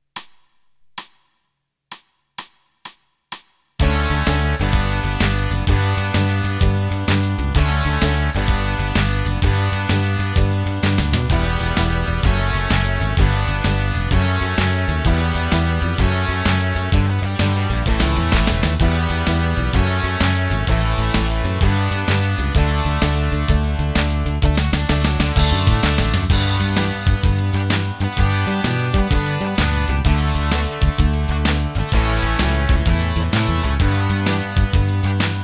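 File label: Shuffle; picked E bass, muted guitar, overdrive guitar and distorted guitar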